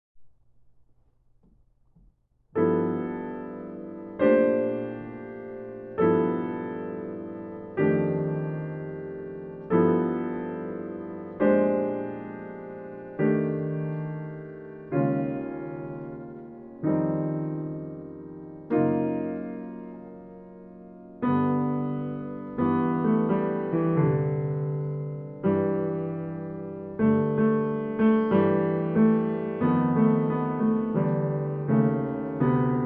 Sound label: Go Tell It on the Mountain (piano solo)